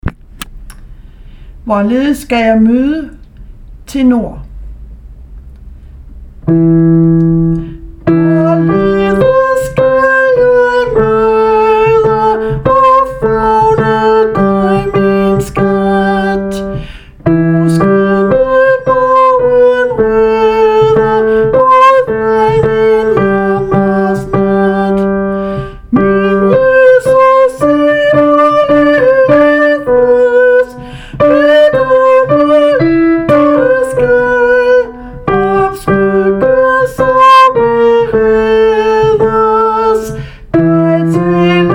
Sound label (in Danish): tenor; hvorledes skal jeg møde